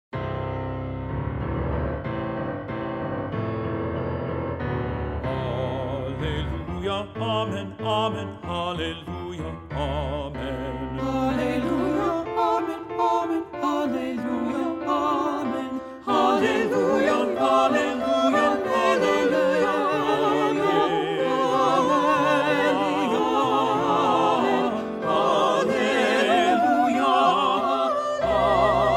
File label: hallelujah amen soprano